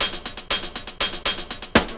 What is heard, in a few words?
drum loops; bb drum loops